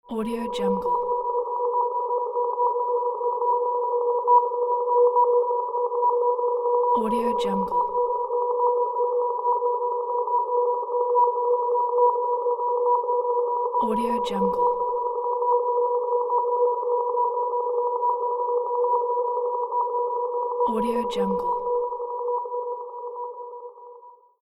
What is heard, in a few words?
sonar